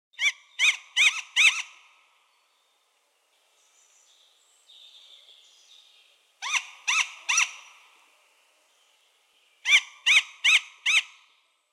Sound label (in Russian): borodataya neyasyt golos